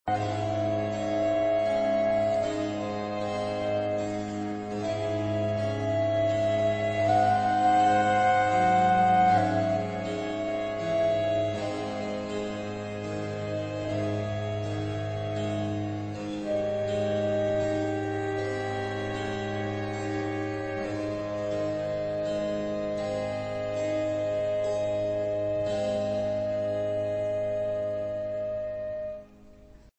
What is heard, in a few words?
american baroque; MagnaLoops